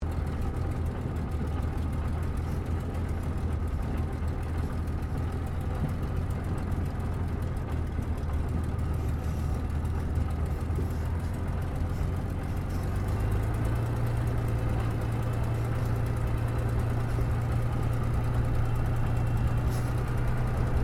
AIRCRAFT SUPERMARINE SPITFIRE MK-VIII TAXI INTERIOR STEREO; Airplane Sound Effects